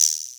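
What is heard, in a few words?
78 Guiro, Boss DR660, perc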